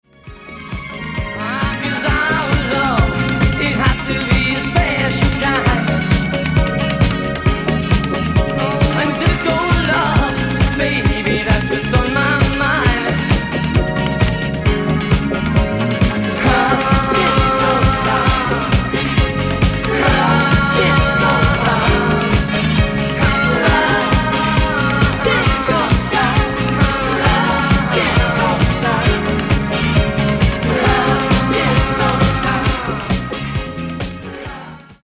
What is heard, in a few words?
Erotic Drum Band Love Disco Style